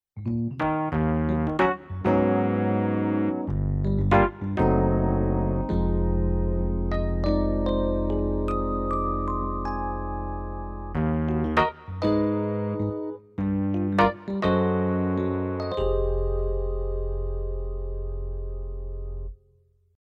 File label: Rhodes